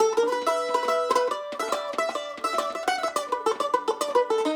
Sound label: 105 Em Resonator Mandolin